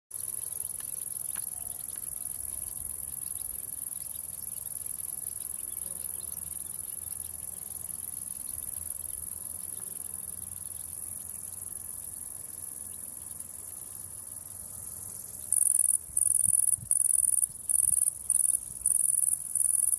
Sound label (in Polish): Odgłosy łąki